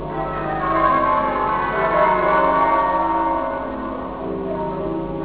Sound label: worcester minor, muffled, bell recordings